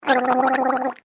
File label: Gurgle Comedy High Gurgle; human sound effects; Funny Sound Sound Effects